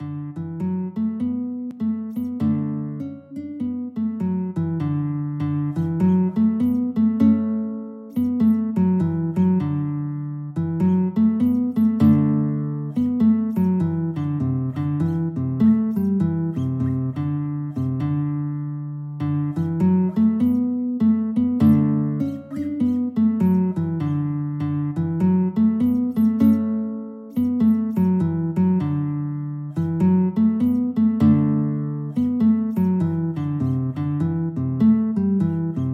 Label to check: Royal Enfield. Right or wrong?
Wrong